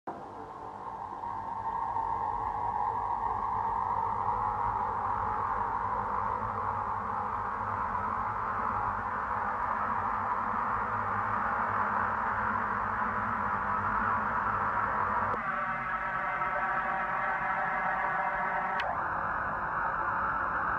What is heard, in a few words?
Tension (Encounter Ambience); Nightmares Before Disney